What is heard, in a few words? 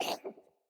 Kitten Cough Hairball; Enemies; Catbrow